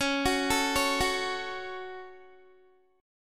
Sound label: Db Suspended 4th Sharp 5th Guitar 4E,4A,5D,5G,5C,6F; arpeggiated